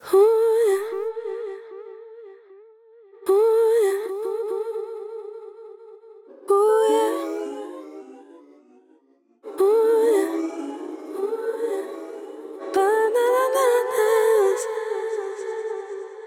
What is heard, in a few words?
delay; unfilteredaudio sandman pro; Delay-Plugins